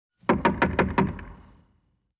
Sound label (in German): Im Buero Schnelles Tuerklopfen